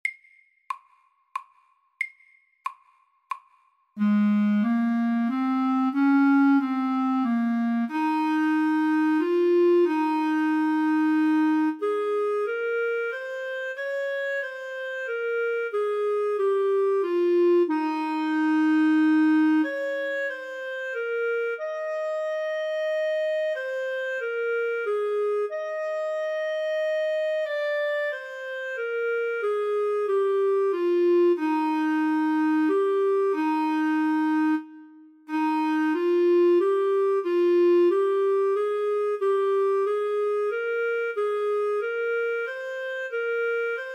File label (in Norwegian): Legato etyde MIDDELS